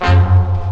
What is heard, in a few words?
hit brass; BRASS KIT; AKAI